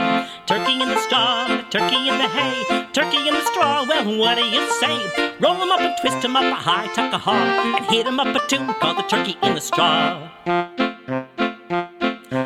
Turkey In The Straw

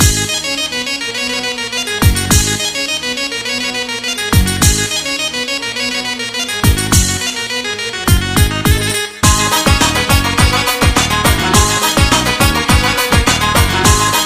Balkan Turbo Folk

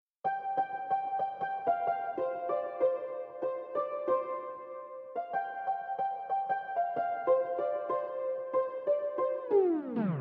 hindi ringtone

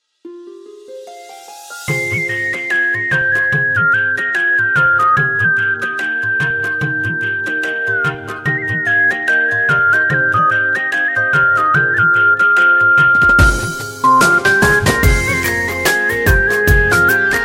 Romanian Music

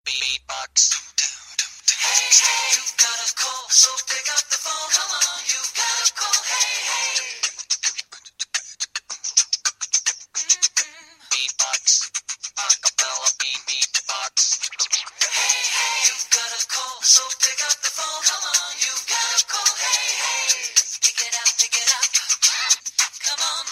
Accabeatbox

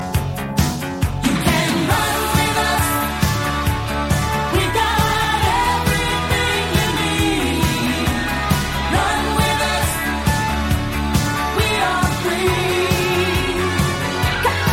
Theme Tune; Raccons

